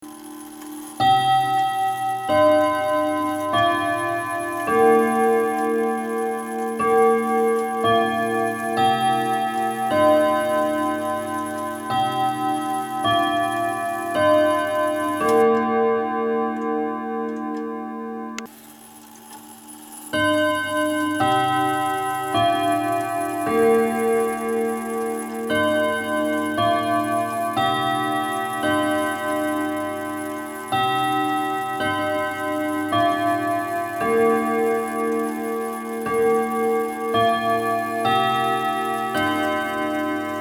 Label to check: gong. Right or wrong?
Right